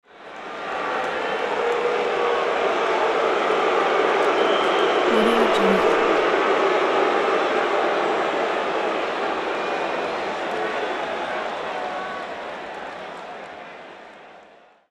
large crowd boo

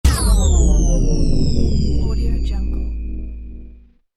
transformer sound system shut down

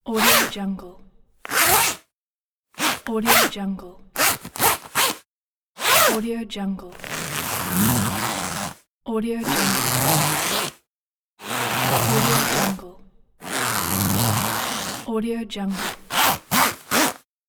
zipper closure on jacket; asepticsounds

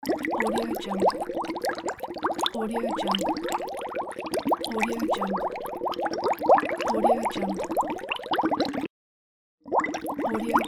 bubbles

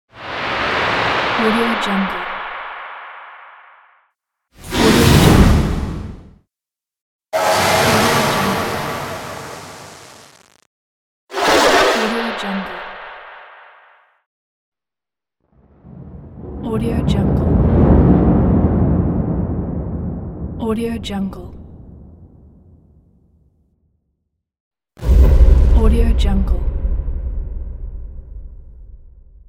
epic transition whoosh swishes; s wansound

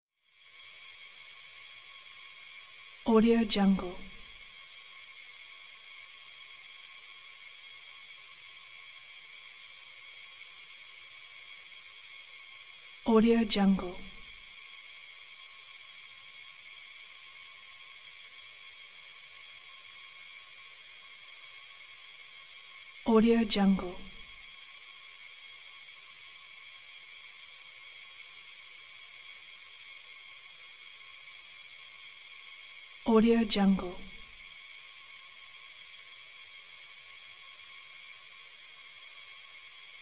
static hiss high thin piercing high end

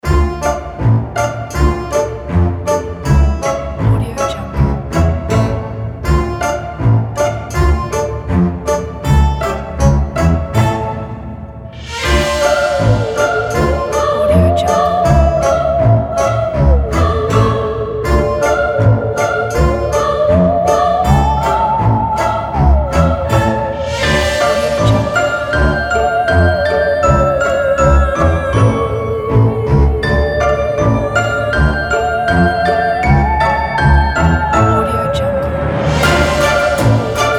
halloween night

